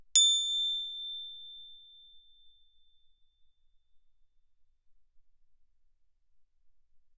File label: crotale A7 ff; Percussion; crotales